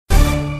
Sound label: wild stop; Criss Cross 81; wazdan